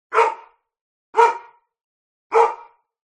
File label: animals dog labrador bark slight distance echo neighbourhood; zapsplat